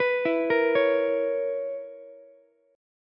B Major 7th Suspended 2nd Suspended 4th B down Ukulele; BM7sus2sus4; arpeggiated; JGuitar